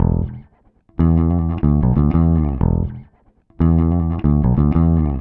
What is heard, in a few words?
091 Dance Pop Bass E, E-Bass; Pop Rock; Pro Tools Essential Loops; Digidesign